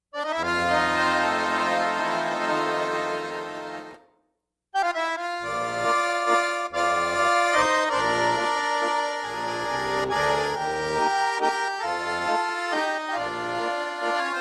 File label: Irish American, Accordion